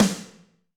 Snr Combo, Alesis D4

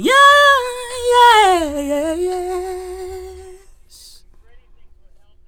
FEM VOX, Hip Hop; E-MU Formula 4000 Series Vol. 1; Hip Hop Nation; Phatt Phemmes 1

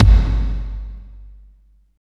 KICK, Tekno Industrial; East West

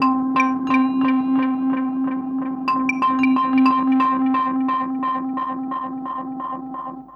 Crude Tape Loop, Tape delay; radiosonics

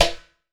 MALAYSIAN DRUM; AKAI S6000 CD-ROM - Volume 5

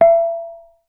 BURMESE G, BURMESE GONG; Voice Crystal - Steve Reid Definitive Percussion

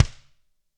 KICK, Drums; ILIO; Double Platinum Drums 2

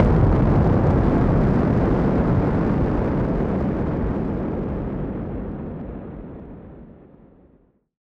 Noise Bomb Harmon; Noise Bombs; musicradar; bomb factory

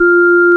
ORG VOX F, Continental; Roland LCDP09 Keys of the 60s and 70s 1

